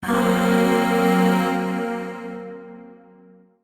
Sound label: Void Shawzin Blues Chord J; Warframe; Zariman Void Shawzin